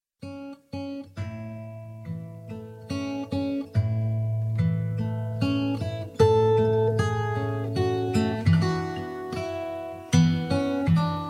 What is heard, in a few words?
Instrumental